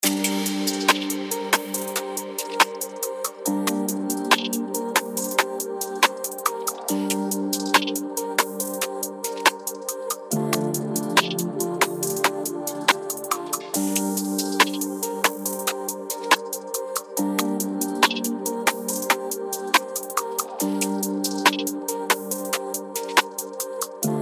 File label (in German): Toploop fuer 808 G Minor 140 BPM